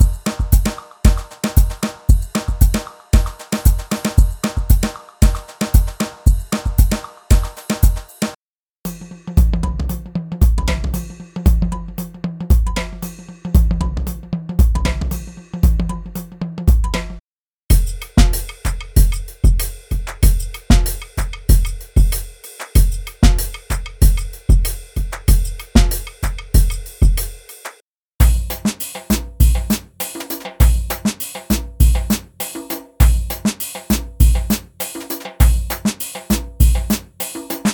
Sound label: Drum Latin; Drum Monkey; Unison MIDI Wizard Plug-In; Latin Drum Demo